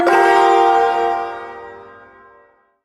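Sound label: Shawzin Minor Chord; Min Chord J; Warframe; Duviri Ersatz Shawzin; Chords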